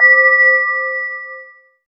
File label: Finger Cymbal; Cymbals